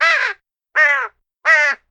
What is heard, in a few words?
crow call; DeadFright